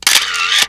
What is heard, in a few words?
screenshot